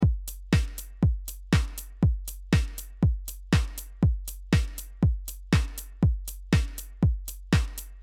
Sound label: HOUSE